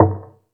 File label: Tool Kit, Drum Machines